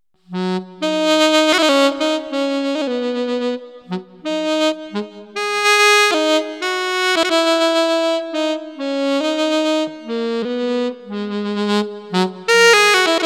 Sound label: Sax Tenor